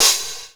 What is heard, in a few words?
Hats and cyms, Funky Drums, Kit hits; Neil Conte's Funky Drums From Hell (1992)